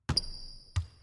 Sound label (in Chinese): 摩擦; 战中; nba